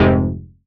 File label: stab F; stabs